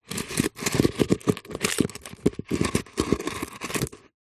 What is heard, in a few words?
foley stone small scrape rub on grit dirt on plastic surface; zapsplat